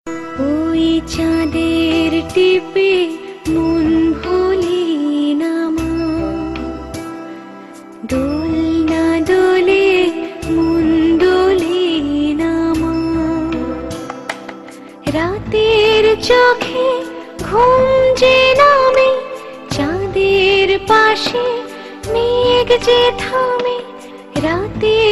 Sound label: Bengali ringtone